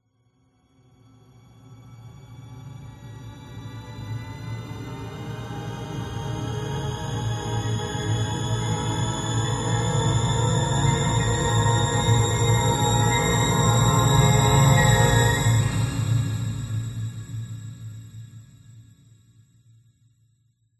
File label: horror riser scending discordant synth dramatic tense